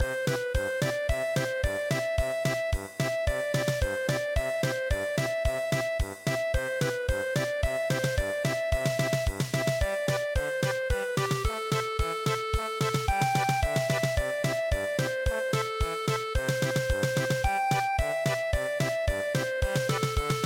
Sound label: polka; Pustono ludo i mlado